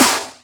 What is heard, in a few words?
Claps; Vengeance; Essential Clubsounds Vol.4